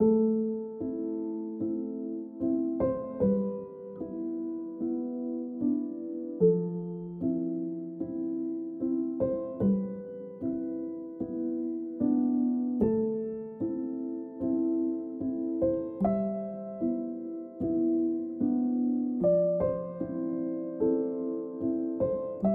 Piano

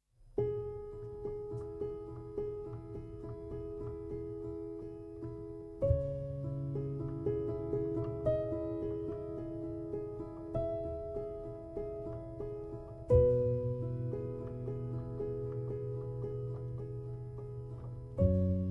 Piano

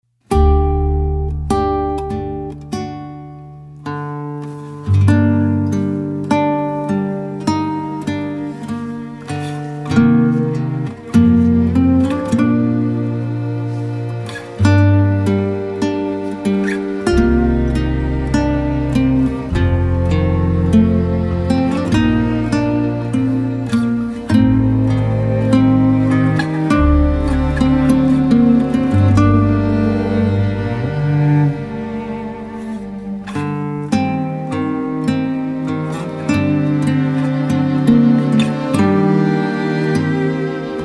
Peaceful Guitar

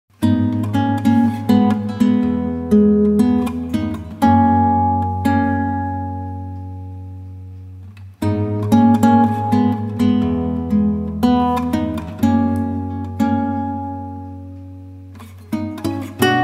Peaceful Guitar

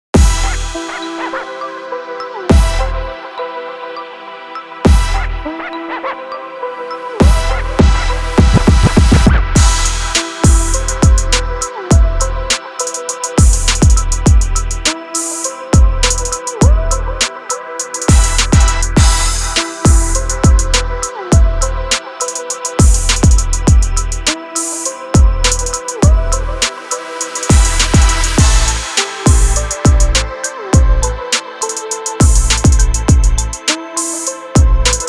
Night Hip Hop

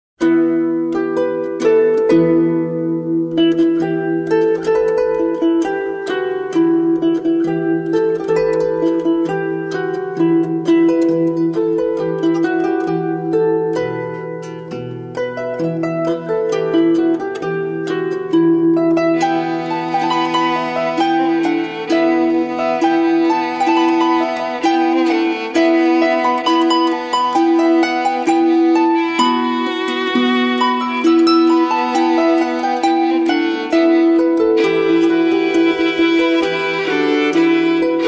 Harp

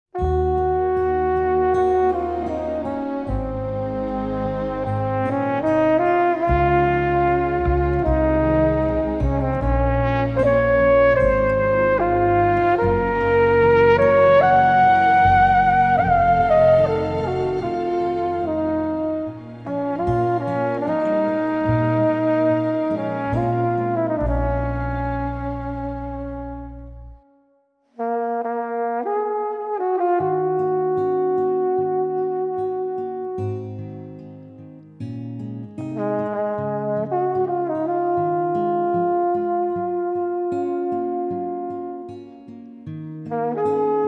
Trumpet

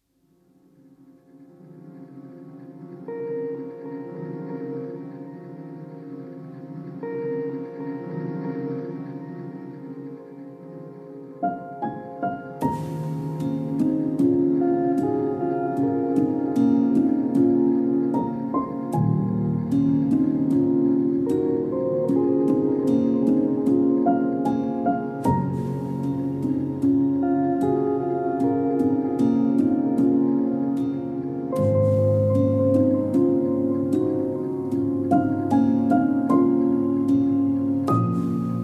Relaxing Songs

